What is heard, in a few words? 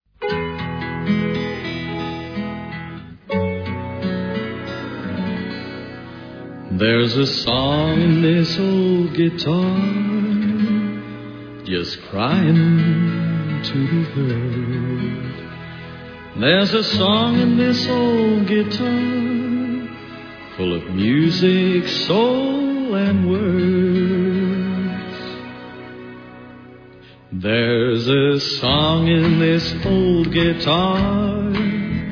Guitar Sample; Ian B. MacLeod